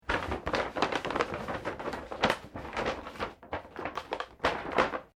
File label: PAPER BAG LARGE MOVEMENT; Material components for constructing more complex sounds; Materials